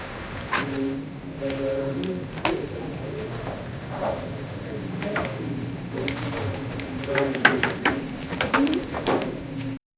low murmur of an audience

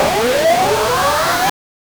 a jumpscare sound effect; sound design

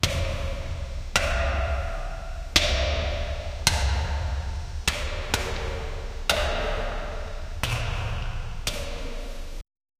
the sound of metal boots, cave

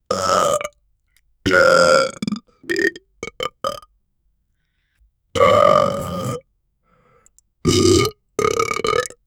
realistics burps whits be, belly

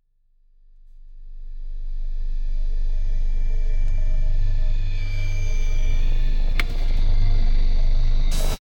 a dark spell effect

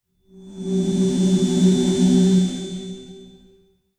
deep aerosol spray sound; oud

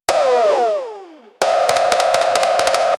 sounds from basketball ga

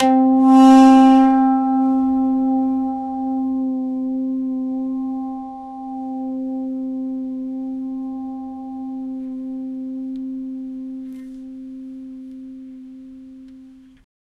philippine kudyapi, traditional instruments

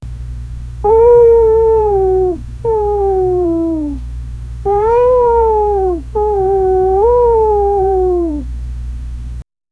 gentle and soothing cat m, feline

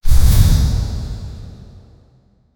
for mmorpg game mage flam, casting

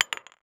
a single pinball tapping

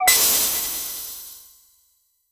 a cha ching noise

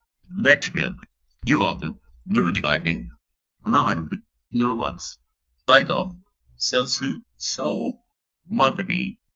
notifications success

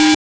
glint completion sound, task

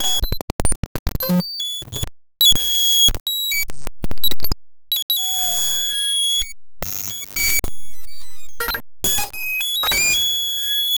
a glitchy electronic sound with rapid beeps

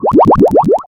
bubble spawning sound; cozy

